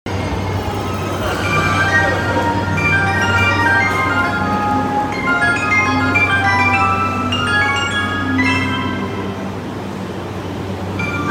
JR山手線五反田, train sounds

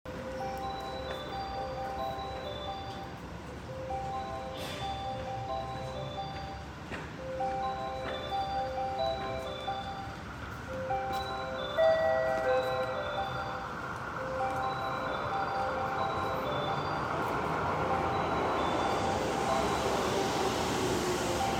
湖西線, train sounds

